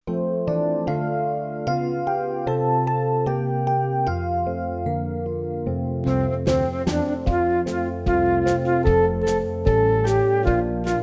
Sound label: instrumental